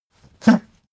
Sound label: pug gag smack short; natur-tiere; hunde